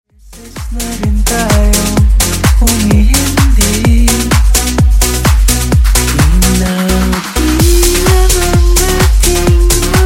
Funk Clean 128 Bpm

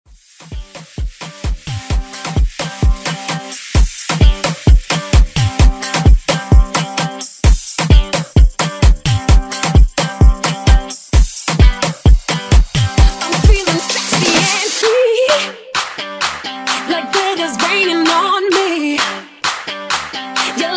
Afrobeat Epic Intro; remixplanet

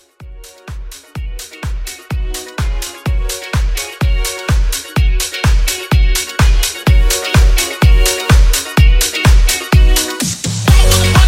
Hype Mix; Ibiza

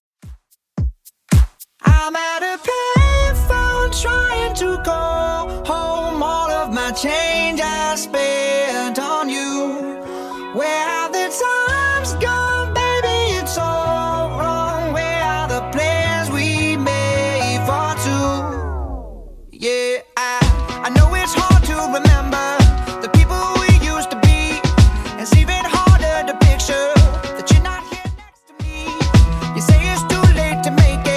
Redrum 110bpm

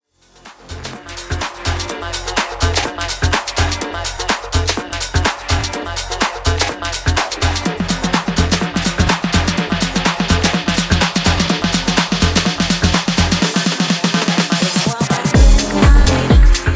Tech House Mixshow 125 Bpm; djyan

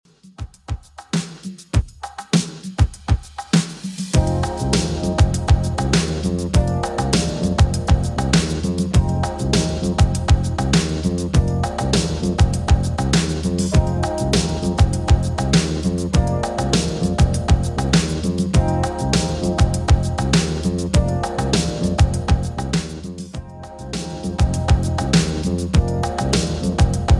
80s Redrum 100bpm

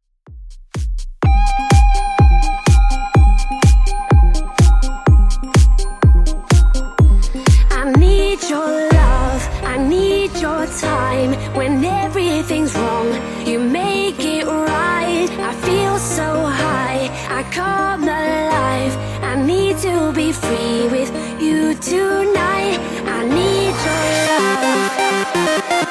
2ks Redrum 125bpm

